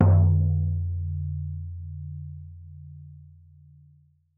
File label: Buffalo Drum - A#; Percussion; Cymatics - Infinity Beta Pack 2.0; Zeus Percussion Collection